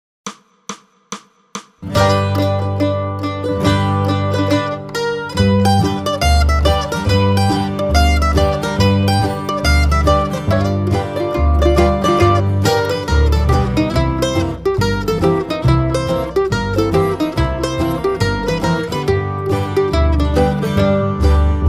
Mandolin 140; Carroll County Blues

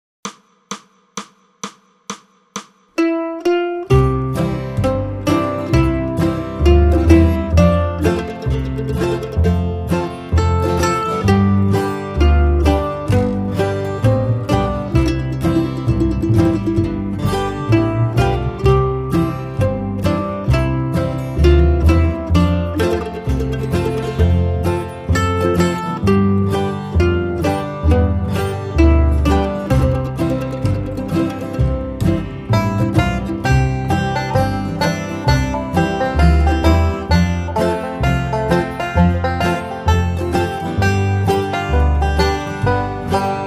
Mandolin 130

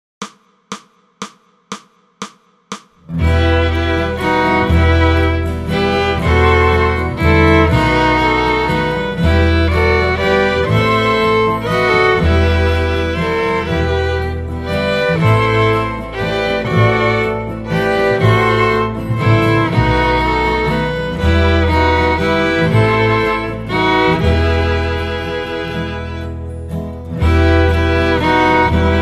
Bass All; christmas; Coventry Carol

